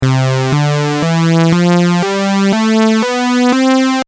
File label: detune